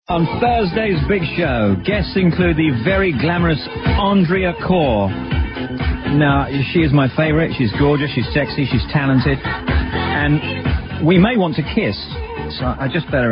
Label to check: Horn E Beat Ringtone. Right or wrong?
Wrong